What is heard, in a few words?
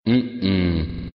huhum; caneca